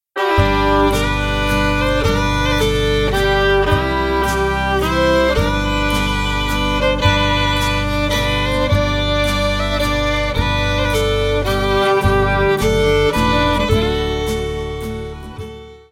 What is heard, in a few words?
Country time